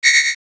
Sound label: system fault